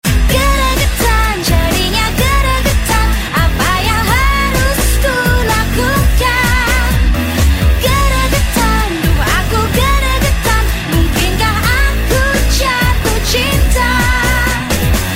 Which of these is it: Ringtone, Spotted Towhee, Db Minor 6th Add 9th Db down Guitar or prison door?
Ringtone